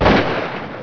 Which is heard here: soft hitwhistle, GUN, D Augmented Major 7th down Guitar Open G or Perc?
GUN